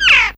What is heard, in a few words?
Crow; Fairlight CMI IIe